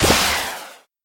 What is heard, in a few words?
Rocket Used; In Power Rocket Used